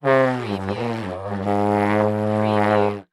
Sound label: Trombone Tired